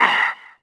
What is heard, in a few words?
grunt light hurt